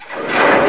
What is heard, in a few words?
door, doors; svencoop; quake1